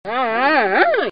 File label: Panda Sound Animals